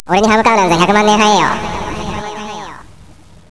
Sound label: SHOOTING; Roly-Polys no Nanakorobi Yaoki